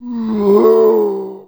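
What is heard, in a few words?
zombie male hit; creature; GammaAge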